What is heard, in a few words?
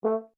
HORN A4 MF STACCATO, French Horn in F; Alexander French Horn in F; Horns (Sound Effects:Musical)